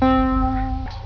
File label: pick letter